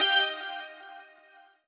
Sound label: normal hit normal